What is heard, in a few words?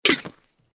Close the shaker lid